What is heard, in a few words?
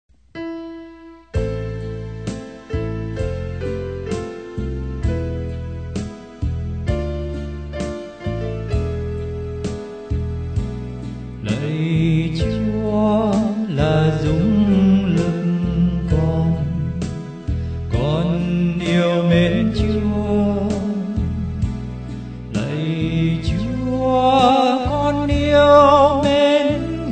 BASS